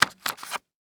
Electronic Camcorder Removing Battery; Panasonic Dvx100

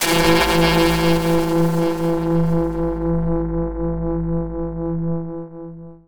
Title Transition Electrical; Production Element Title Transition

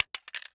Impact Plastic Object Dropped On Ground Small

